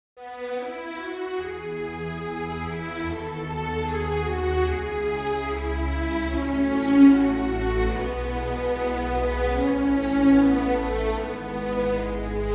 Suite for Strings Postlude

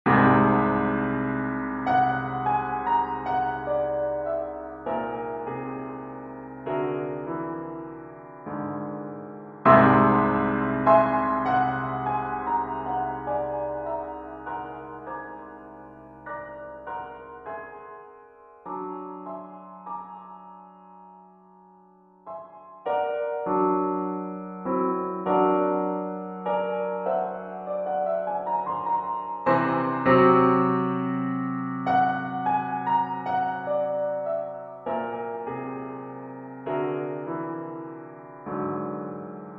Messiaen Exercise Piano

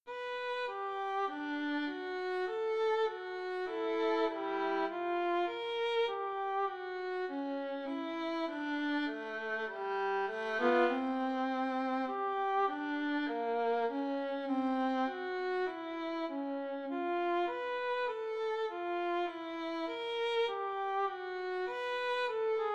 Soliloquy for Violin

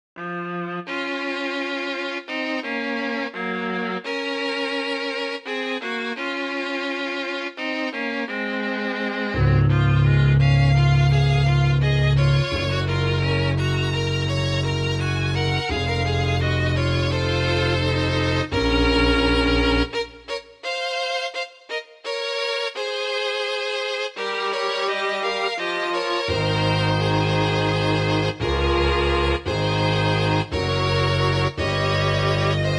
Quartet in Db Major Bb Minor

